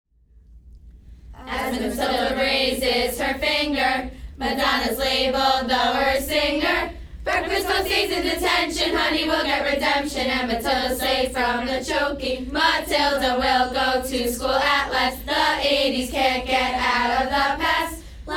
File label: cheers